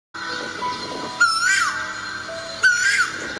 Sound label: Recording, bird